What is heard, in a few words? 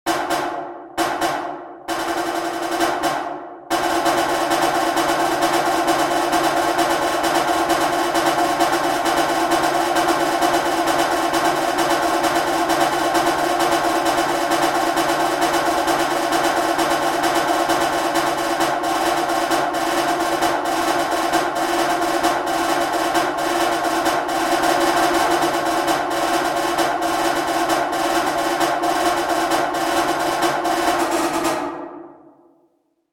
School Songs snare; marching